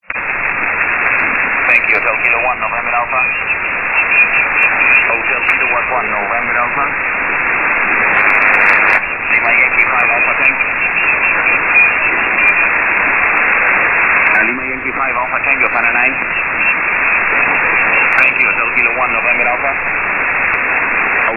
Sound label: usb